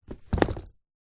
shoes business leather buckle zipper womans set down on wood floor; zapsplat; foley